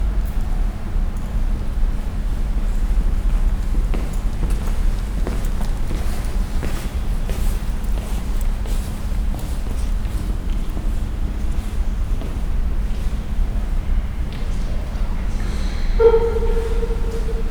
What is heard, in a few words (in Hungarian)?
elsetalnak katedralis hangjelzes